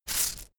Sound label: insect shaker hit double soft